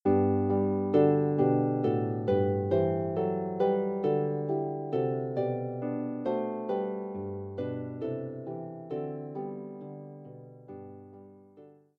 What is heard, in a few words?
hyfrydol, Harp; most popular hymn tunes